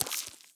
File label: spider; animal